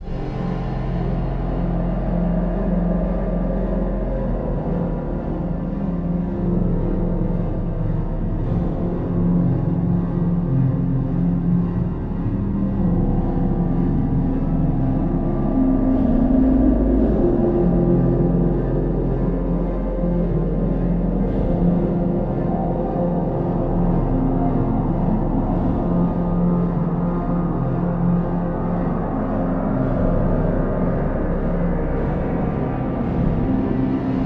Final Ambience; Friday Night Funkin' vs. Void Linux macOS Windows gamerip 2022

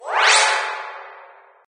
Dice; Magic the Gathering Shandalar

